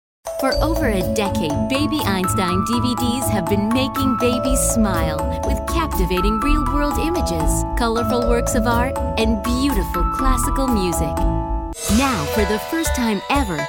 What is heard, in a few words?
baby einstein music